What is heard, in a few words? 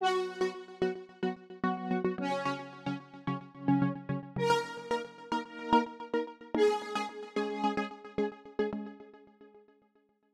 8bit